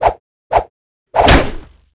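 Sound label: Attack Sword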